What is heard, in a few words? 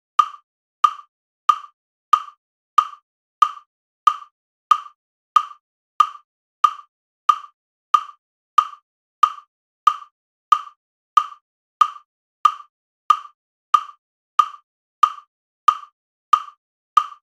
metronome